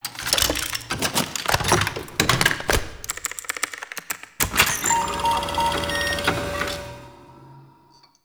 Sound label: Fixing Computer; Entrati Labs; Loid